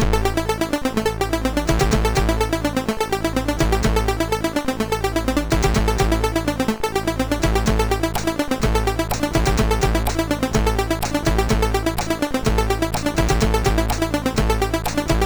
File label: Intro Jam, MOS6581R2